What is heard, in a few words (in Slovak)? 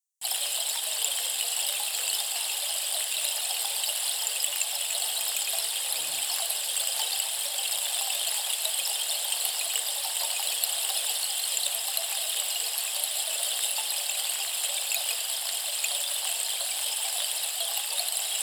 harmonizujuci dazdovy stlp 50 cm olse; 8 minut